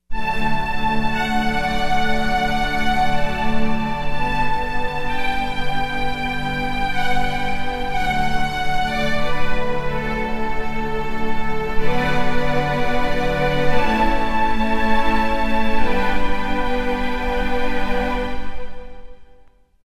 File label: Lo Fi Stringz; Roland SRX-05 Supreme Dance